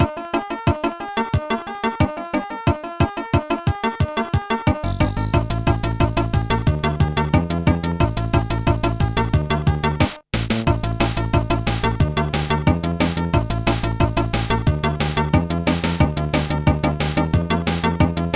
noise mercenary blues, chiptune

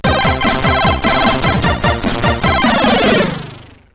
sid CSG8580R5

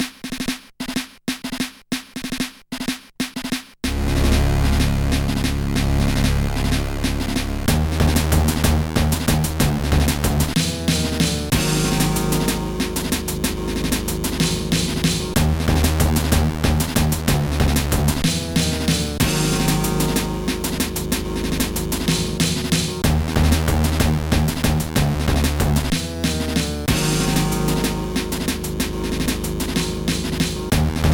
heavy metal

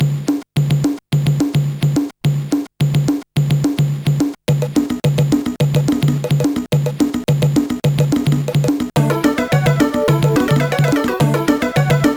rhythm test; MusicLine

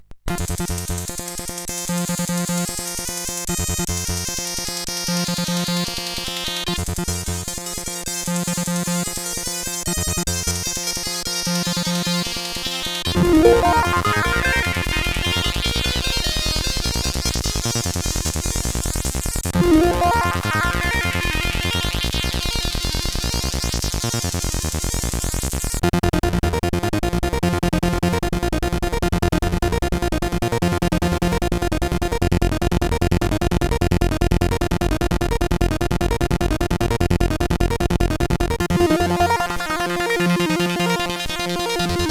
Fart in Elevator, sid CSG8580R5

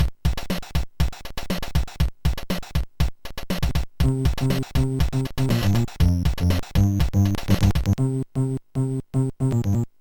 Hip Hop; Sequencer One

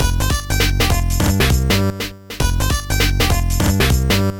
beatbox; Pinball Dreams

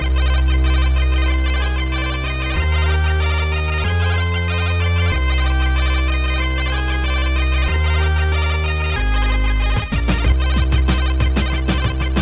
beepy music; Eddie; Trackers; Modland

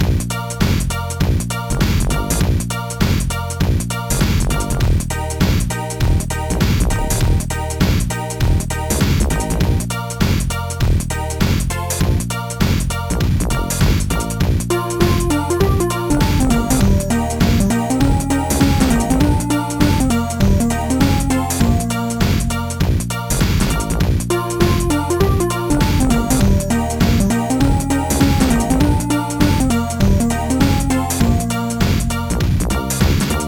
duck rap; duck-rap v1.1; Droid

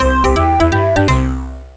jingle game over; Gear Works

